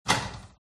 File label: open door cupboard; fox audio